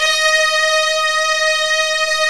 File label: HIGH STRINGS